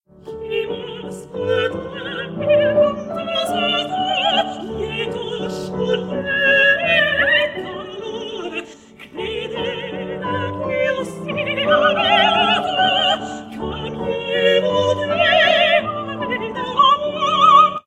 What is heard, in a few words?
sop manip